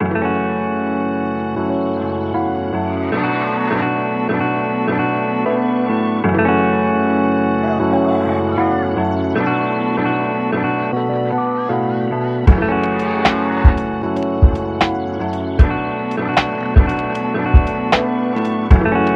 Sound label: lofi animal farm rave